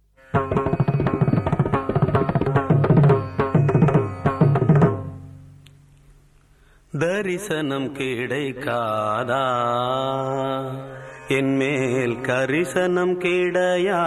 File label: Male